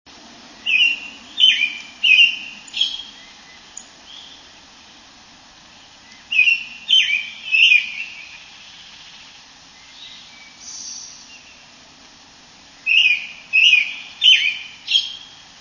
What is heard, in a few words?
robin american with high note suffix; birdsongs